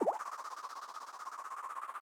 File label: drop swoosh; CrossCode